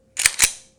glock racking loaded

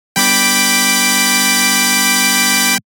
Analog Crazy Organ; jup8

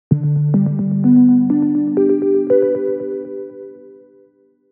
Caverns Keys, jup8

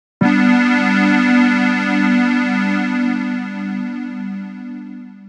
Synthwave Story Pad, jup8

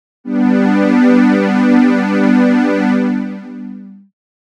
Mellow Str; jup8

